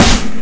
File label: Snare (E2); guitar rock tour; River